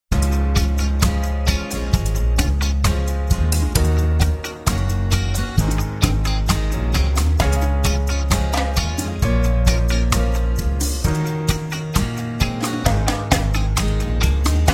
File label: Soothing Reggae Jazz Instrumental; Cafe Atmosphere